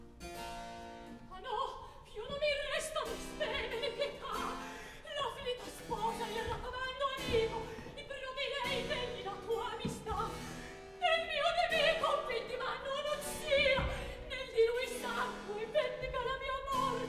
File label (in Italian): Atto terzo Recitativo; Scena 2; Cecilio, Cinna; Mozart; Operas; KV 135 Lucio Silla - 1772